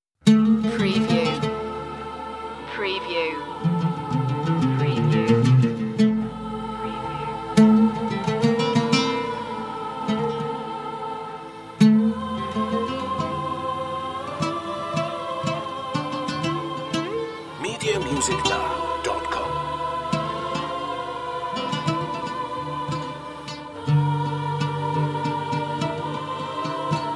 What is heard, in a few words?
solo oud